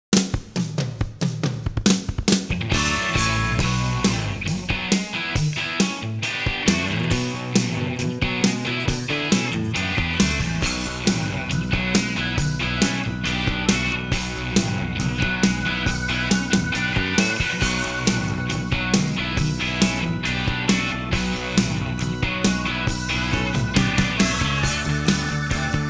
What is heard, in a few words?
jammin no horns